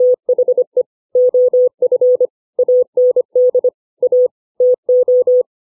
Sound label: five common words, CW